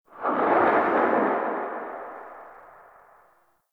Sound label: Void Move; Warframe; Focus; Operators; Operator Wraith Movement